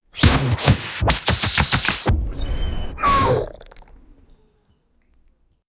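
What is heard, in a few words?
water dripping bwuh comedic, sting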